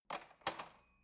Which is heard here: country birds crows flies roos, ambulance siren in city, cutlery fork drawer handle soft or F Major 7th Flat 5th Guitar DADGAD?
cutlery fork drawer handle soft